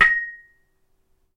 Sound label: knock on iron tube; knocking